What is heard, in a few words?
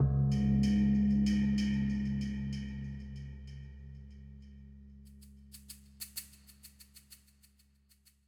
Cave PERC; Minotaur